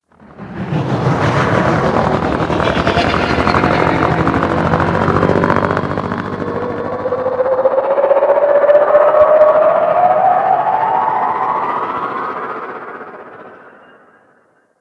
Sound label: Drop Ship Depart Distant; Warframe; Vehicles; Grineer Drop Ship